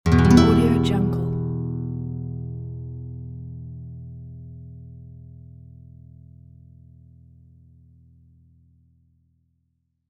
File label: western classical guitar chord